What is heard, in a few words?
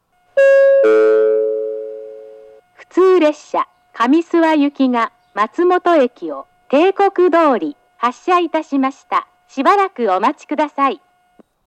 hirooka 2 bannsenn matsumoto hassha